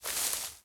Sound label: grass step; Golden Crayon Sounds